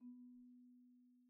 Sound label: Marimba hit Outrigger B2 soft; Idiophones; Struck Idiophones